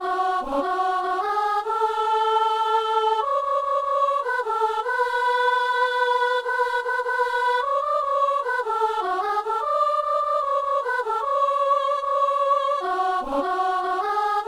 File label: soprano; Messe du 17 janvier à Saint-Denis